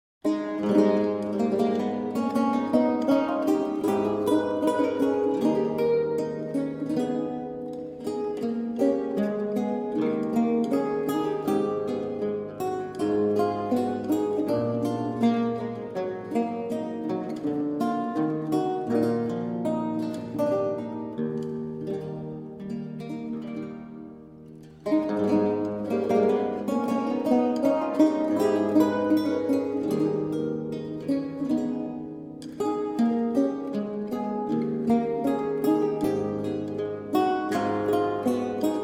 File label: Duet I Allemande in A Minor, lute; Anton Logy